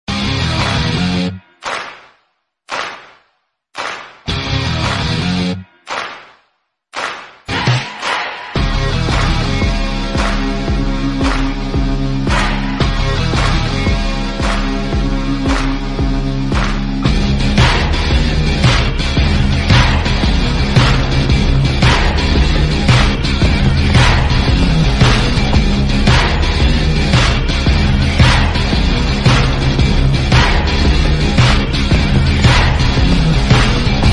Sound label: Bgm Tamil Ringtone; Soorarai Pottru